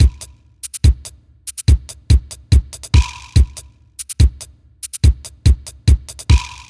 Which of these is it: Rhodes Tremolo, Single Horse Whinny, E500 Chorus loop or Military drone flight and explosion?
E500 Chorus loop